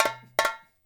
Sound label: FILL, Percussion; InVision Interactive